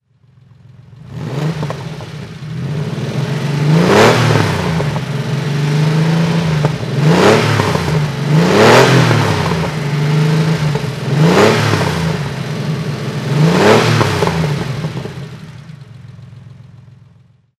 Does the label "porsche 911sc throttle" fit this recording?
Yes